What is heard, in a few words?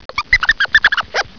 pain; quake2; prabbit